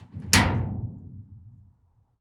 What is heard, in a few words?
Opening Garage Door Handle